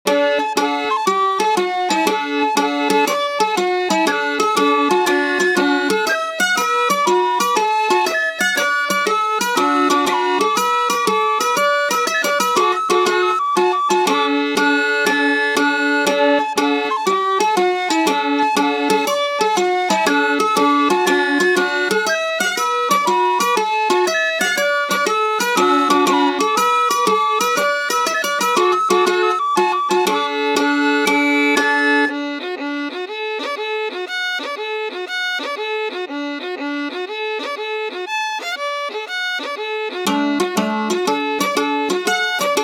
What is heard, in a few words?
tin whistle loop